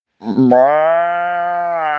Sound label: maaahh; maaaa